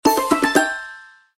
WIN TINY; Orca

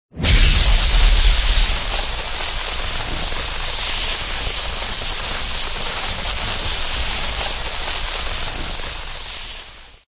buff thunder; Thunder Fighter

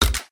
spin button; Zhao Cai Tong Zi

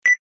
buff use; Thunder Fighter

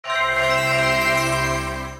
clouds rainbow; Full Of Luck

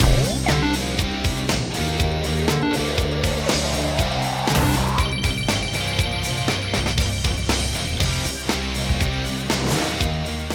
bigwin rock; Wild Jack

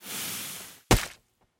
gamble enter; games; Corrida Romance; Wazdan

